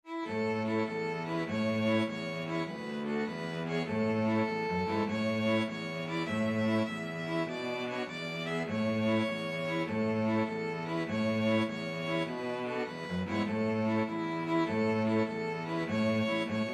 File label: string quartet